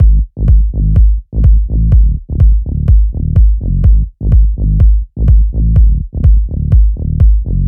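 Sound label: Bass Loop, A flat minor, 125bpm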